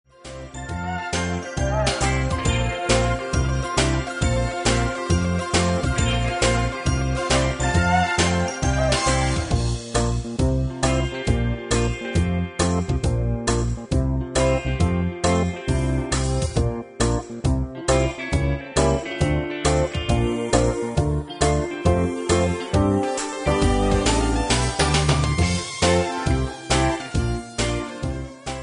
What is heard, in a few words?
hip hop playback